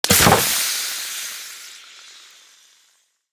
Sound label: smithing table; Minecraft; Votive World